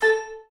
spin click; adelia The Fortune Wielder; Foxium

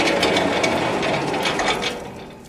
door Opening Sound; loki Wild Tiles Desktop; Ingenuity Gaming

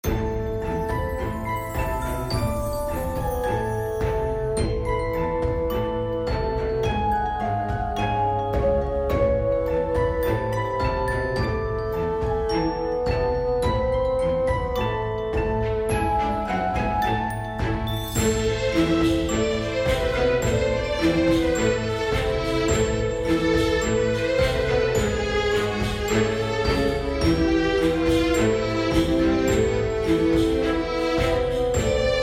Regular Music; Fire Wizard; Axial

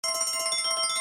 Counter Super Big Win Loop; fire Wizard; Axial

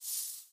bonus slide off; punk; cassandra

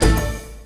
continue button; PushGaming; Peter And The Lost Boys; peter Pan

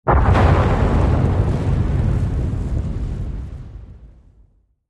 symbol freespin x3; Dragons Myth

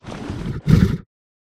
bonus idle; Recode; rampage riches Desktop

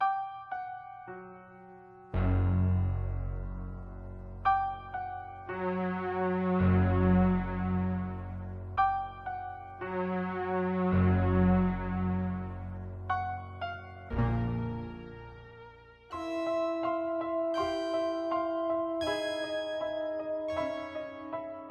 Base Music Loop; halloween; Mahi Gaming

